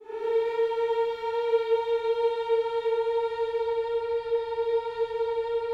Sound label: HMMS A#4, GOSPEL HMM; Spectrasonics; Vocal Planet